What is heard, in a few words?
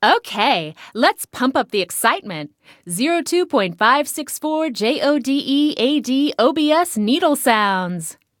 Radio Jingle; let it die; OBS